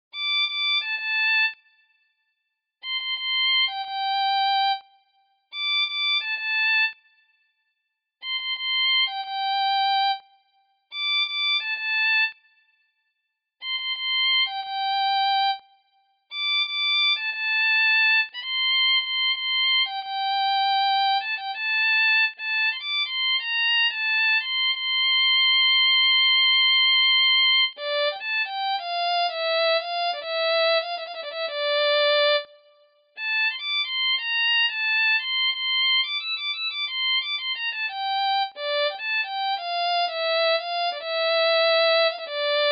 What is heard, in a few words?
Violin